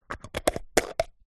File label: food vegemite jar lid spin close; zapsplat